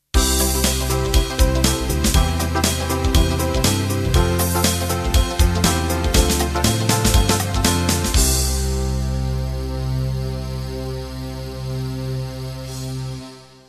Fusion End

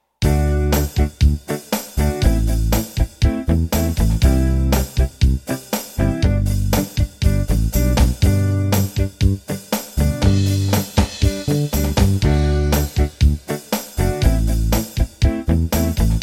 8beat rock

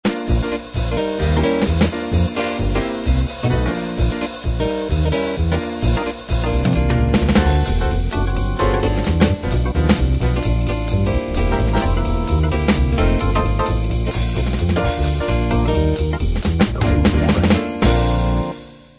bop

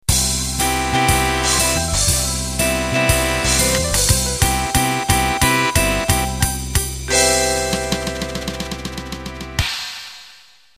Cool Jazz End

